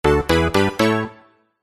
multimedia game sound musical warm bold retro 70s synth complete finish